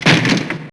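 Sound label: Door slam; PC Gamer IT CD 38; thief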